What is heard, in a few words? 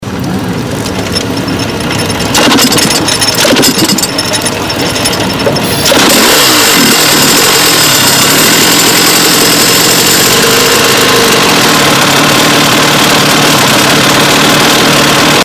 TZ250 Starting